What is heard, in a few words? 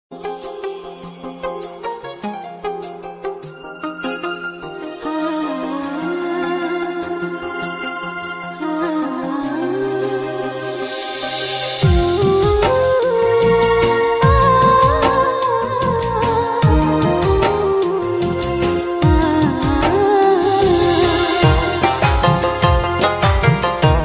new sad ringtone bangla ringtone; mk boy ringtone